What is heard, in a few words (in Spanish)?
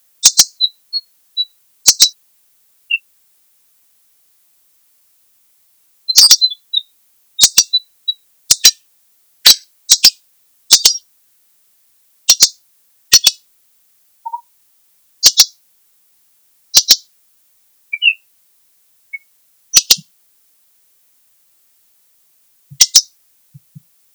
martin pescador chico